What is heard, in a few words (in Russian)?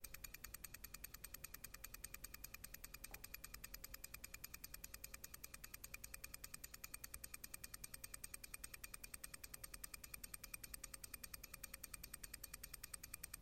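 секундомер тикает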